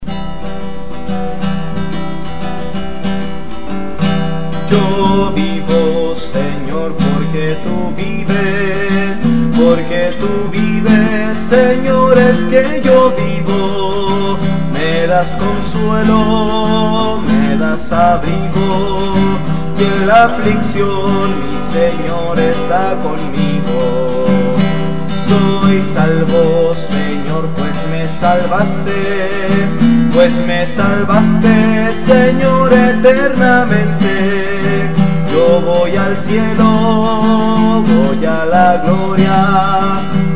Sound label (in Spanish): melodia palabras